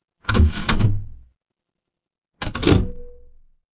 mechanical lever

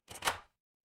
door lock

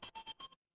ui power off

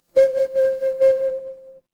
instrument singing bowl

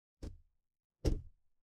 impact thud

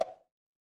ui pop

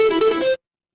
retro game game over

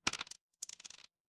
impact dice roll

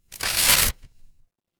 paper fabric tear

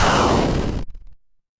retro game explosion

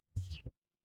ui power off

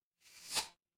impact sword draw

